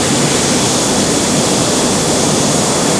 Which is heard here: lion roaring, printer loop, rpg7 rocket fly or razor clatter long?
rpg7 rocket fly